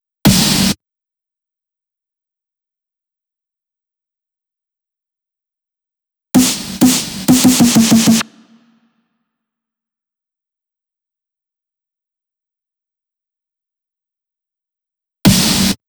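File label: Drum Loop 128BPM snare Big; VEC5; Drumloops; Multi Loops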